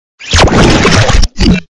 Grito de Polteageist

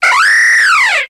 Grito de Hawlucha

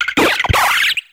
Grito de Trumbeak

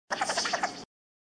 Grito de Spewpa

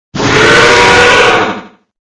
Grito de Dracovish

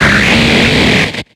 Grito de Flygon

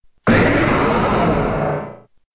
Grito de Mega-Tyranitar

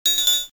Grito; Chimecho

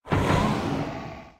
Grito de Rillaboom

